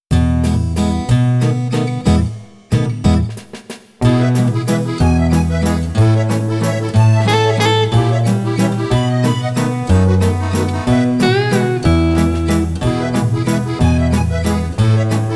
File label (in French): Syncope l'alouette (Instrumental)